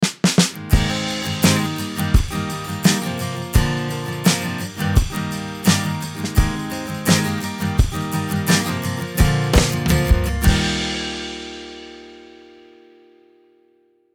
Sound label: acoustic